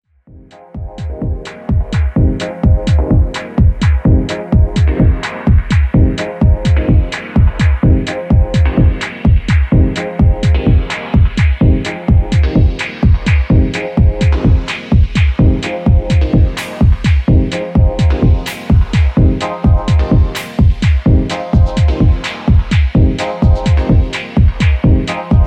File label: dub harmonics